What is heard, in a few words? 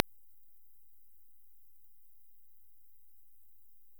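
Chalinolobus morio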